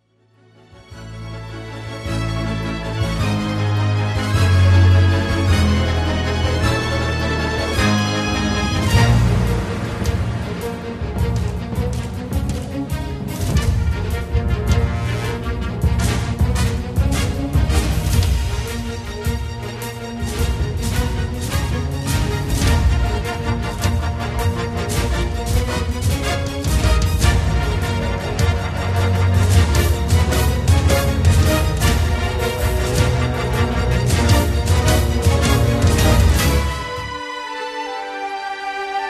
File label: Big Orchestral drama; filmmusik